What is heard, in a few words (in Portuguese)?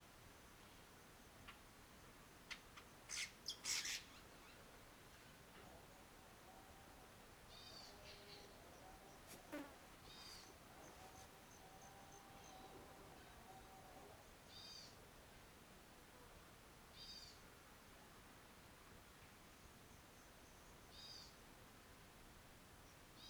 Ambiencia janela do quarto com cortador de grama longe, grilo e passaro; diferente; ambiencia rural